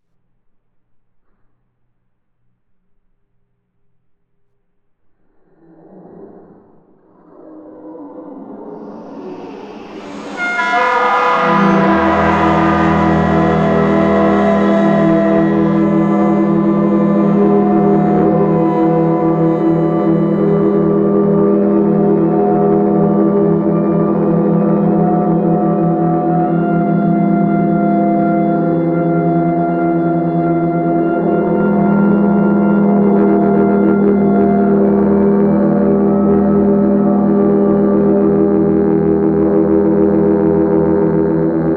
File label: suona; Suona (film music)